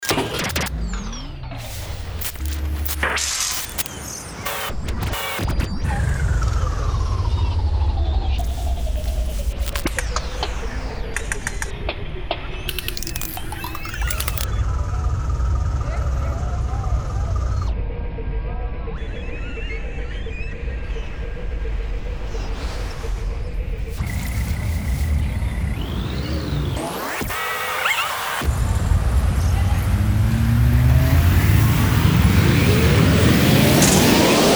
Worlds Strangest Drone; Best Of N2O Library; Drones & Foundations

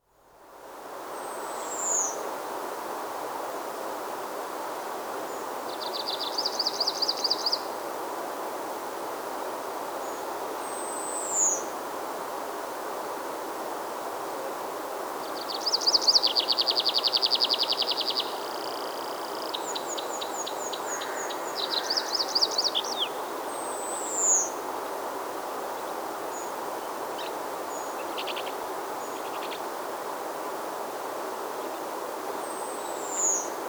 Morning In the Mountains, Nature Sounds; Dr. Sound Effects; 210 Nature Sounds - 20 Hours of Relaxing Natural Ambiences for Meditation and Sleep

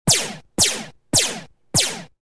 Synth Energy Zaps Four Short Bursts; Electronic Sci-fi

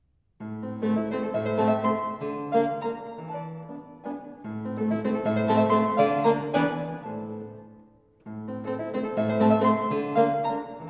Bagatelles for Solo Fortepiano; Eric Zivian; Beethoven

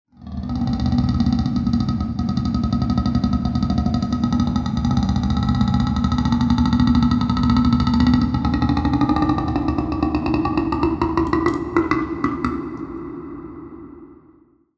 Halloween Creepy Noise Or A Belch; Best Of N2O Library; Drones & Foundations

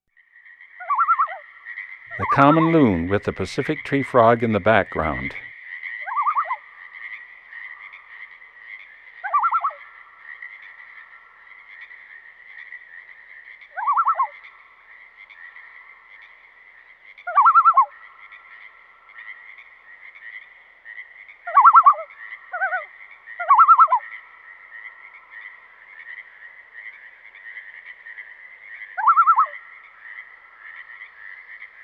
Common Loon and Pacific Tree Frog; Western North America; Dan Gibson; Wildlife Identification By Sound

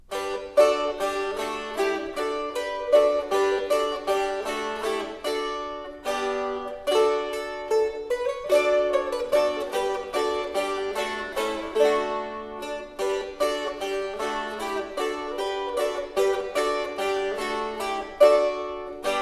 A French toy (Cittern)

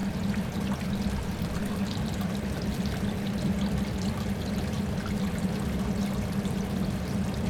DRAINPIPE; 1000fx; BACK GROUND

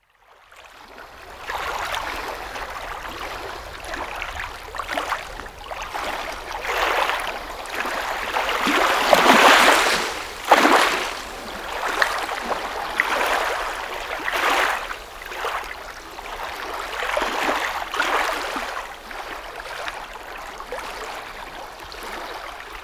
Water Washing Over Pebbles On the Shore; Dr. Sound Effects; 210 Nature Sounds - 20 Hours of Relaxing Natural Ambiences for Meditation and Sleep

